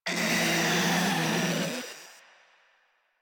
Infested Ancient Death; Warframe; Proto Infested

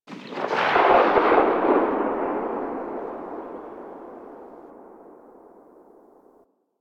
Cannon Tail; Grineer

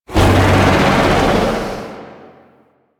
Void Dragon Vocal Set Pain; Enemies; Warframe; Duviri

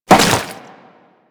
Crewman Step Flesh, Footsteps; Warframe; Corpus

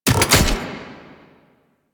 Archgun Charged Mech; Warframe; Archwing

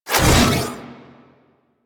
Ability Throw; Warframe; Warframes; Trapper

